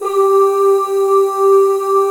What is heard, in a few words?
G3 MALE OO; Northstar - New Gold VOL-2